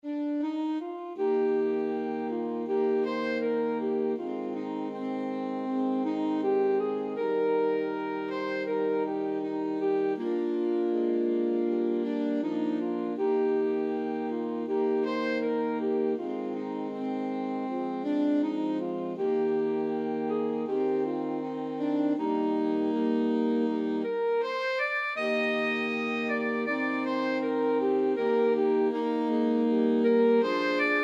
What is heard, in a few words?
alto sax quartet